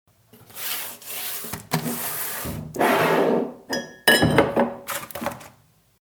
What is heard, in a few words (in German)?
Glaeser